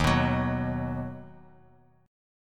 D sharp Minor Sharp 5th D sharp down Guitar Open D